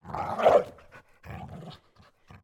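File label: mutt growl, interior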